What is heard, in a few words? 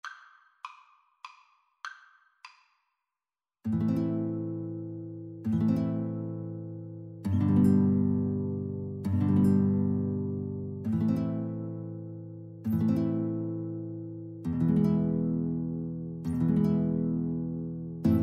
greensleeves, Guitar-Flute Duet